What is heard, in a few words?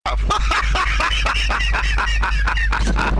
Laugh; torchlight